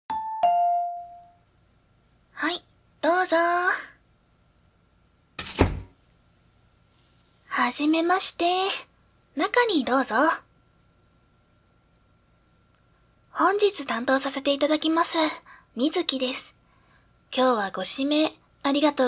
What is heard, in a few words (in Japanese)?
オイルマッサージ, ASMR; 入店から